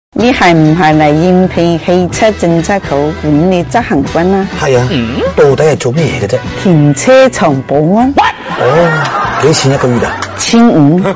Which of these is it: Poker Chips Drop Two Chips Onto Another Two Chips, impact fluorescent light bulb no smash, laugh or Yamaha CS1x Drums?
laugh